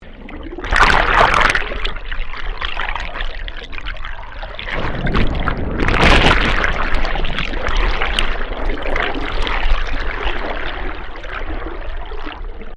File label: Underwater Wave Extreme, Waves recorded underwater on Queensland's Sunshine coast.; Water Sound Effects; Nature